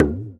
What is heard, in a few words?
EQ Perc, Percussion Mix